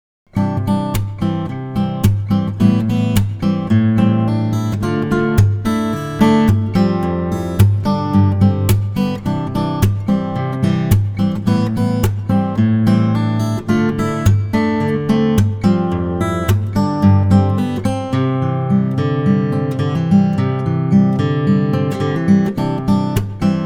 Bossa complete, acustic guitar

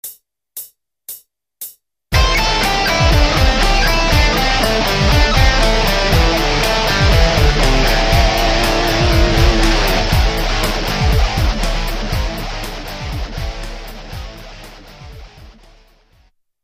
Solo, penta

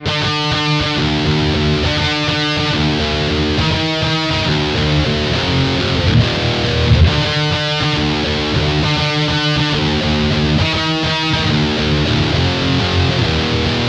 dist bridge, gibson pick, guitar; 57plus

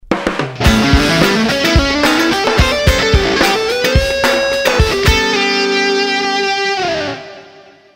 arp lick, guitar

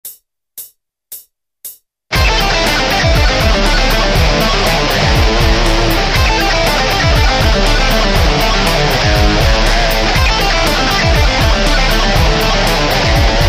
Solo, penta; 16tel

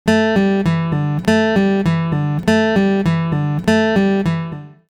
Pull-Off, acustic

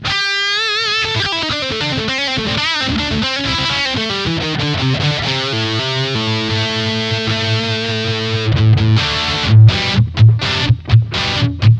bridge p94; gibson

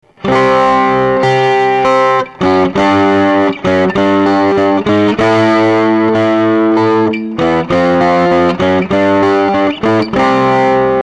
slow rhythm

